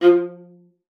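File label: F5, viola spiccato; Xsample VOL-5; 90 s Sample CDs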